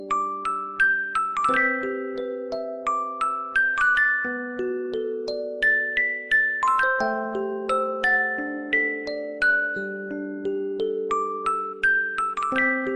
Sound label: beautiful music